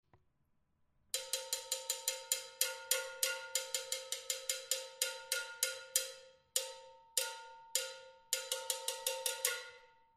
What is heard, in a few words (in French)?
cloche